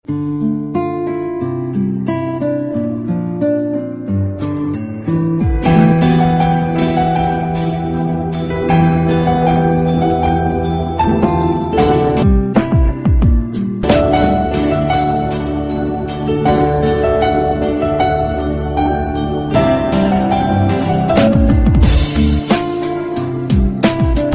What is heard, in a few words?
love tone mix